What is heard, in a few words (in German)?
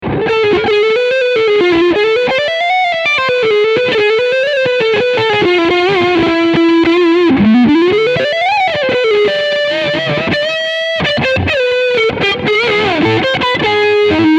Leadsound Hals HB